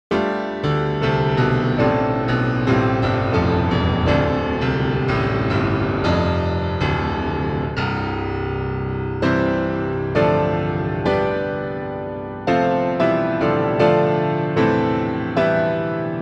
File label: growlier bass